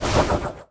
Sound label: Weapons Swing